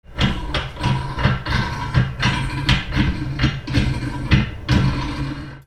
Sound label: BATHROOM